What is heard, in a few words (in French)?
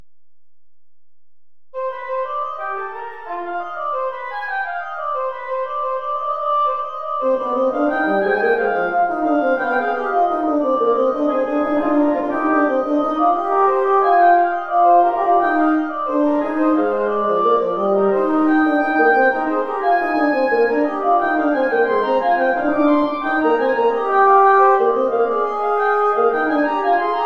bois; bach johann sebastian; 15 inventions a 3 voix